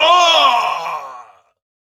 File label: Death; Warframe; Dax Ashigaru; Battle Barks